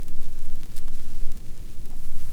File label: CRACKLE FX R; Big Beat Samples & More; AKAI; OTHERFX KIT